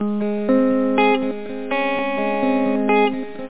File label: melodie, guitarras